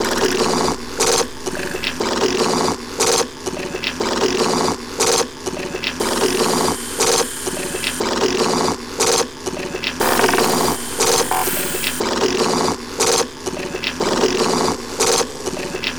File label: Difference Engine, Machinery; Sonic Foundry (Sony Creative Software); Myths of Technology